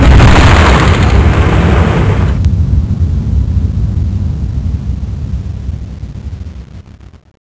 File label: touchdown, B747; Aircraft; FS9; PF2K